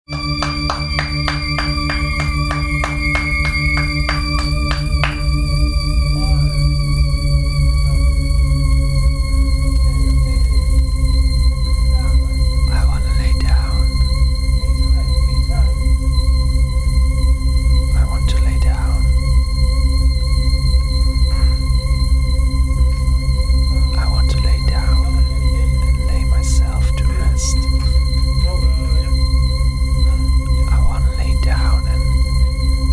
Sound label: soundscape